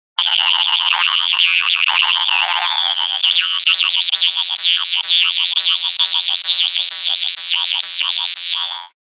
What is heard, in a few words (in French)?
Guimbarde